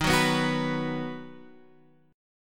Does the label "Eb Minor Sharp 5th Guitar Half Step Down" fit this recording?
Yes